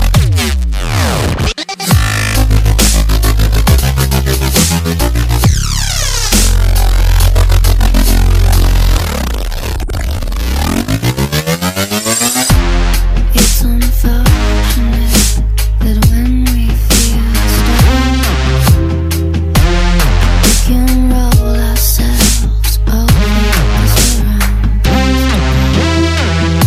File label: dubstep; electronic